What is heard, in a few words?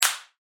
thin wispy clap